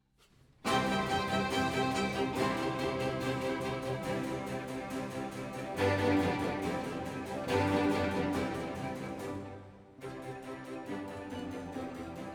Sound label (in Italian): Sinfonia - Allegro; Antonio Vivaldi (1678 - 1741); Vivaldi - Armida (2010)